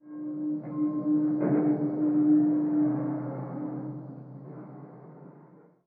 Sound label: ramproom, ambient; nuke